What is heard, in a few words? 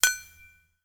empire game profession production complete alert bell chime; ring hit